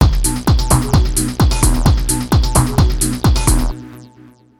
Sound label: 130 tech beat with acid